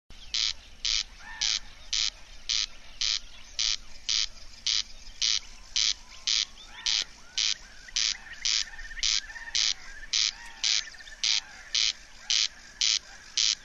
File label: crex crex courlis